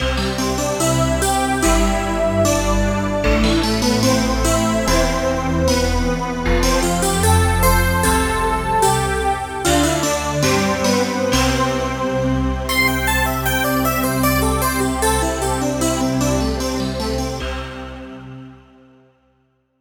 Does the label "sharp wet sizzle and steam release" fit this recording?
No